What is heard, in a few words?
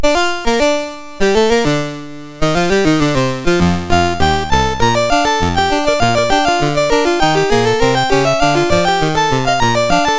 Sunflower Slow Drag, MOS6581R4